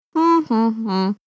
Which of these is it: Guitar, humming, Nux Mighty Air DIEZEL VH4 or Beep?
humming